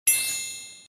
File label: Skill Ready